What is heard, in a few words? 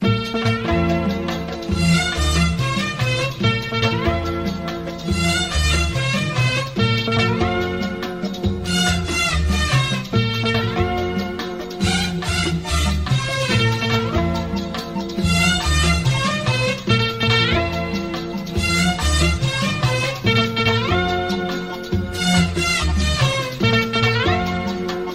Classical